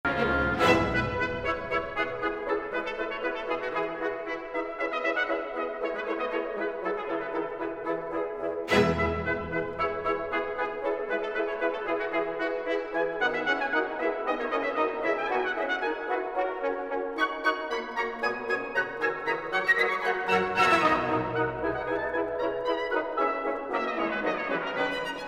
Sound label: Toccata